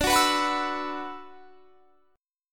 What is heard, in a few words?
D 6th Add 9th D; D6add9 strummed